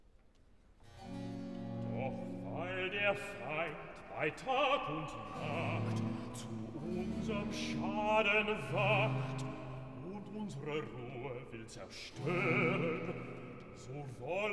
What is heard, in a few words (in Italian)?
Recitativo e Coro; New Year's Day; BWV 41 'Jesu, nun sei gepreiset'; V. Recitativo e Coro 'Doch weil der Feind bei Tag und; Bach; Berlin; Cantata